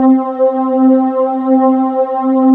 SILK PAD; Best Service Dream Experience

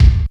ROCK PERC.

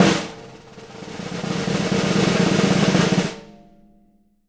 snare roll, JZ XSNARES; Sampleheads; Peter Erskine Living Drums

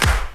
KIT, Dance Mega Drums; Best Service

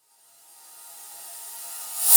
Reverse Cymbals

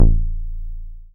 Bass SH-101

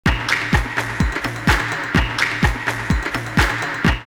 drumloops Techno House 126; Making Happy; X-Static Goldmine

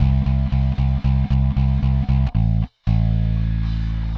Bass, Indie Rock; Sonic Foundry (Sony Creative Software); Indie Rock Anthems; Session 06 - Haze

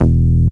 bass square E, Bass Shots, Chicago House; Classic Chicago House

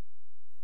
A#, FX; Big Fish Audio

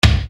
Bass Dr, EuroDance Bdrums

